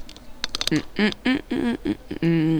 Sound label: HMHMHM